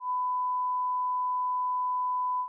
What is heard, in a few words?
Low amplitude 1000